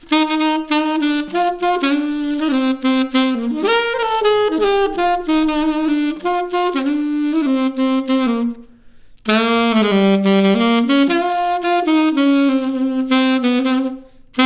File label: Solo Sax